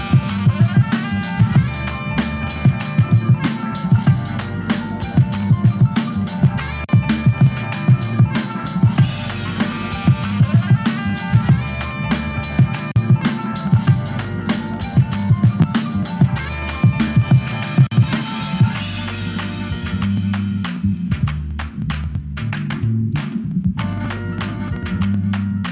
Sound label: Instrumental